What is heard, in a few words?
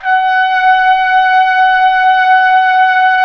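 trumpet p; Roland L-CDX-03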